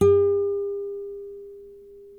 NYL NV A, nylon no vib guitars; Spectrasonics; Hans Zimmer Guitars VOL-1

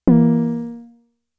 Snare, Drum Machines; Fricke Schlagzwerg

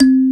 Kalimba C2, E-MU Proteus-2000

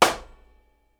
SNARE, Live Snares

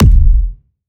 kick rumbly; Polyend; Recue

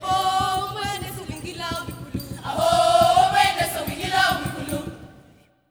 AHO D7; Spectrasonics; Heart of Africa 2

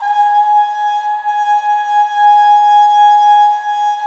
BRS TRUMPETS, Roland LA; Roland - LA Composer vol. 1

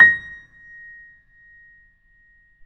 Bosendorfer, Keyboards; E-MU Producer Series Vol. 2 – More Studio Essentials

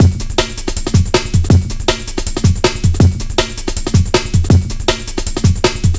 RIM, 160BPM, breakcore

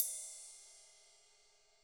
Drums, TAMA; ILIO; Double Platinum Drums 1; TAMA+TAMA

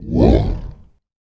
VOICE FX, Dance Vocals

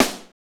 snare, R&B Snares, Roland; Northstar - Drumscapes Roland

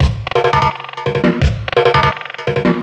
Mixed Percussion, percussion loops

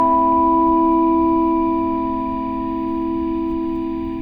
Electric Piano; EXOLINGUISTK; Brooklyn Feels

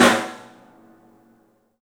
STEEL, Drums, ROOM SINGLE; 3D Sound

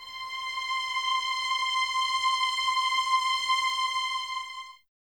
C 6, String Ensembles Violins; Miroslav Vitous; 11 Violins

